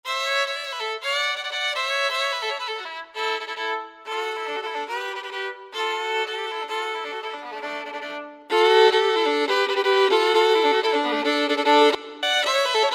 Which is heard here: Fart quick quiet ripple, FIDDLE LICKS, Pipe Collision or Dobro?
FIDDLE LICKS